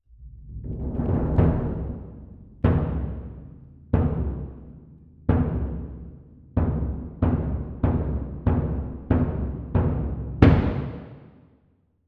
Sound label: Perc